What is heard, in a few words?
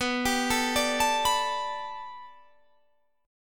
B Minor 7th Sharp 5th B, chord